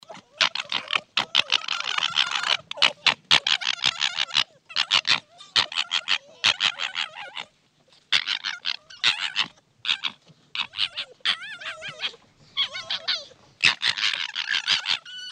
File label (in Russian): zvuk lisenka; zvuki lisy